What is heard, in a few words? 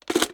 reload drum drum hit; reloads; gmod